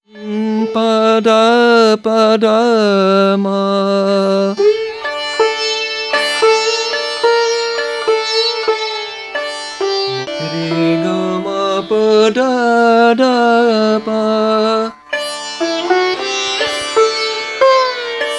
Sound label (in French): Son cythare